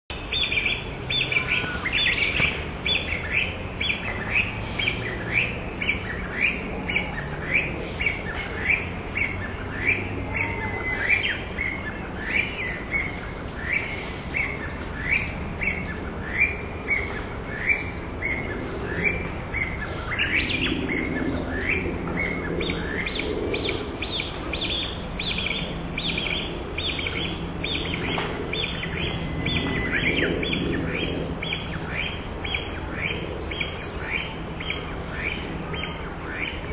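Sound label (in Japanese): kojukei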